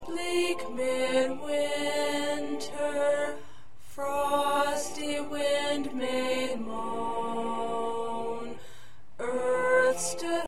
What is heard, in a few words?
Alto